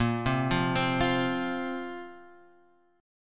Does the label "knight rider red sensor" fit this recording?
No